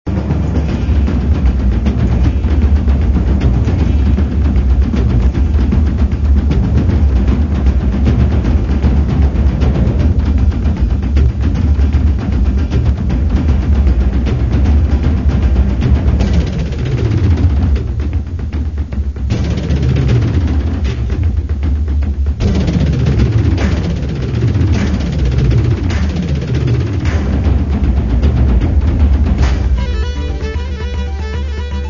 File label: Celtic Drums; newworld